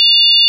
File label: sine; ものづくり基礎工学（情報工学分野）; 音の波形と分析